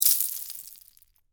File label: Debris Drop Penne, Rigate; Texture; Materials; BlastWave